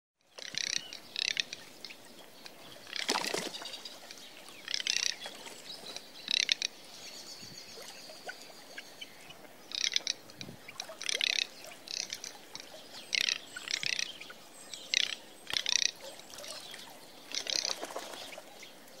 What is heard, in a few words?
garganey bird sound